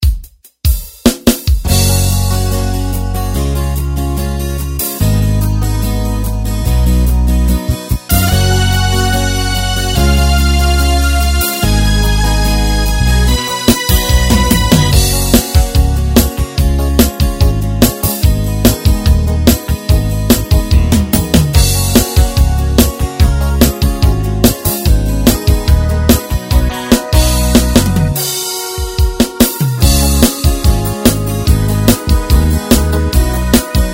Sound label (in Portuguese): Demo Romantico, RITMOS YAMAHA